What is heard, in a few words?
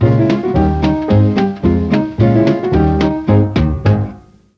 G1 foxtrot sax, mellotron